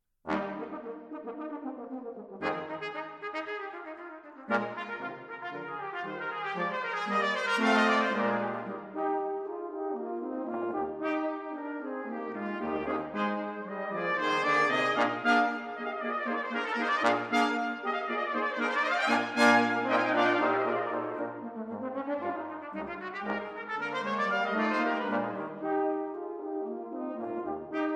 Quintet No. 2 In E-Flat, Op. 6 - Mvt. 3. Allegro Vivace